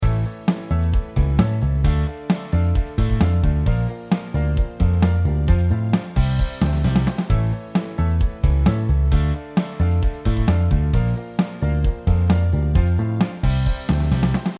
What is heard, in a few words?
combined riff